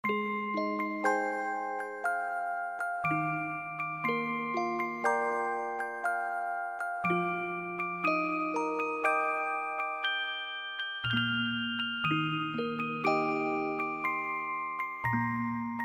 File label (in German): Orgel